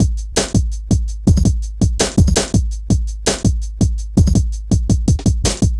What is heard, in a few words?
Ezee Rolla, Drum Loops